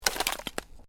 Ice: short crack of ice under pressure; Snow; Weather; Stuart Duffield

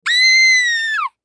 Human Scream; Scream High Pitch Scared Male Seven Yrs Boy; Screaming; Blastwave

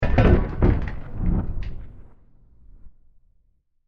Crash Impact With Delays; Burst; Impacts; Crafted Signals

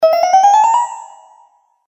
Magical Movement; Magic Sound Effects

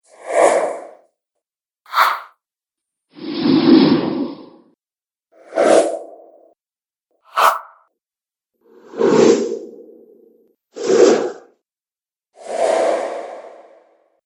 Strong Wind Whooshes; Whoosh Sound Effects

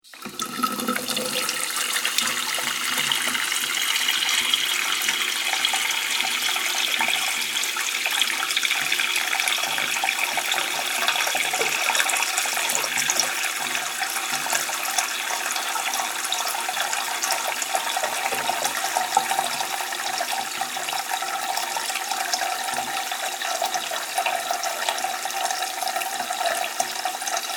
Water Filling Shallow Bath; Bathroom Sound Effects

